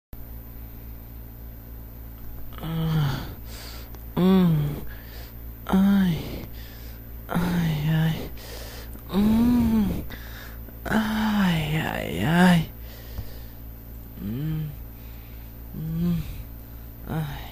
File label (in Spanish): gemidos